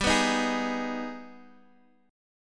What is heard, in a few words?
G sharp Minor Major 7th G